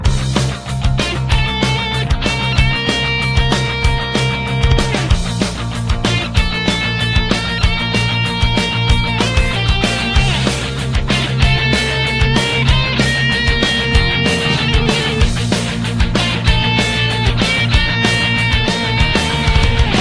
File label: Simple N Clean Rock